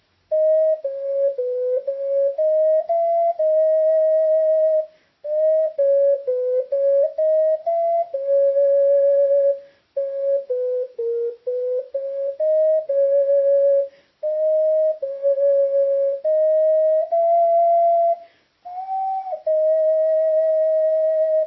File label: lullaby